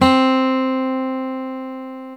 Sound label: guitar